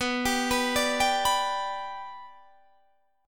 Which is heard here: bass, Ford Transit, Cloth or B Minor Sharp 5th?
B Minor Sharp 5th